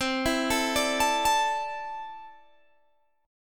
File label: C 6th Add 9th C down Guitar Open G